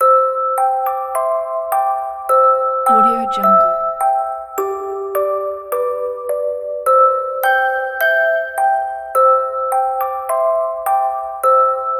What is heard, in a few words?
music box lullaby mary had a little lamb